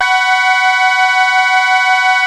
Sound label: QUACK CHORD